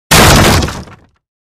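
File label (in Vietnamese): tiếng đập cửa xuống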